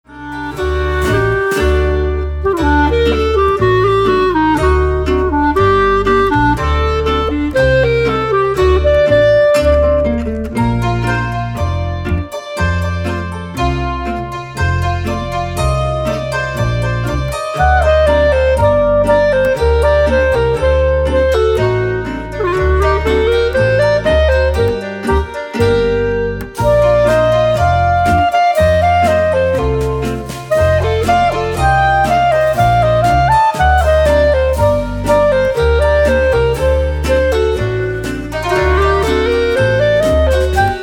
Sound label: Bavarian Klezmer